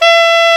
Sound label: sax alto mp E, Short